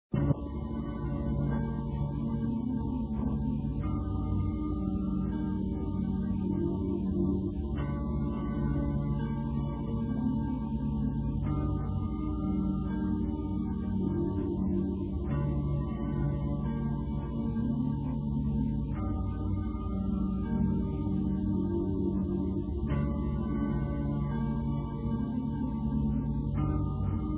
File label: electronic music trance